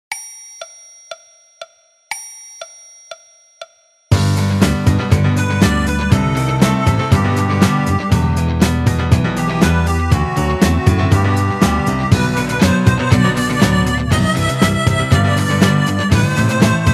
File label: Orchestra Version